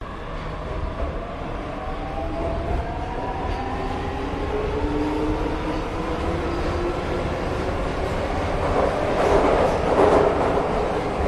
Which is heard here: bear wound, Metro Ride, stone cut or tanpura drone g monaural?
Metro Ride